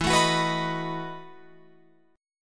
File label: E Major 7th Suspended 2nd Suspended 4th, Guitar, Open F; EM7sus2sus4 strummed